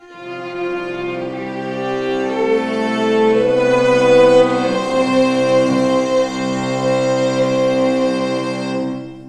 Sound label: Chamber F 155bpm, Strings; Zero-G; Total Drum Bass